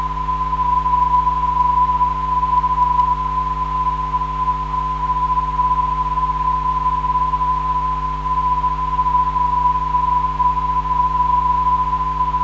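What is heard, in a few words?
377kHz, non-directional beacon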